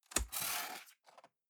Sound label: cardboard box close fold scrape single; objekte